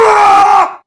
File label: mob undead attack